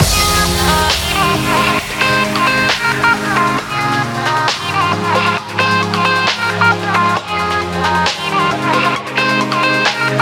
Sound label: TRAP LOOP 134 Fm